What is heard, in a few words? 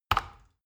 plastic button tiny click heavy fast